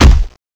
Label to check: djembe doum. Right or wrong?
Wrong